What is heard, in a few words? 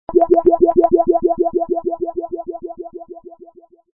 Science Lab Right Knob